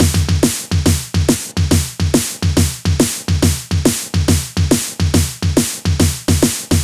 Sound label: Loop 140 BPM; vengeance essential club sounds-3